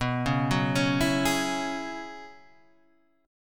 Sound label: F sharp 7th Suspended 2nd Suspended 4th, B